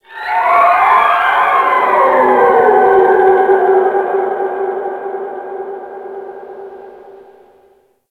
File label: Ocean Dolphin; Ambience; Warframe; Grineer Ocean